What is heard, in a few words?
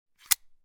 gun bersa; still north media